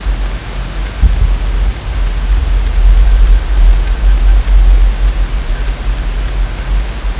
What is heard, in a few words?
M5 Alaska, seismometer; diy home seismometer station